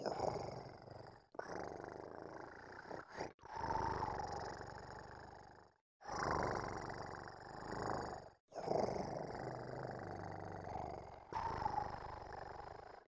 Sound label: sleep; Hollow Knight: Silksong; Beastling